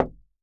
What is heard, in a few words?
Wood Door Single Knock, Household